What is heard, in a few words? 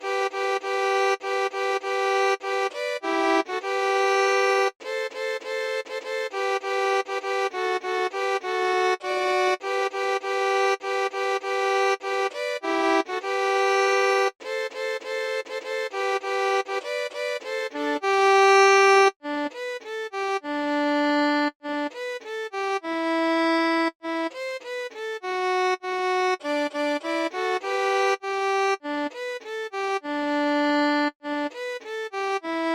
Jingle bells